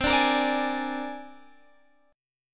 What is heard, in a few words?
C 7th Suspended 2nd Sharp 5th, C, down, Guitar, Open G